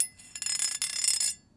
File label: Serrated Knife - Scrape; Micro Pack